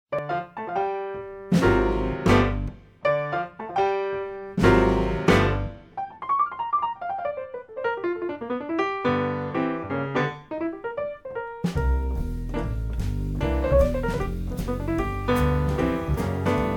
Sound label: Blues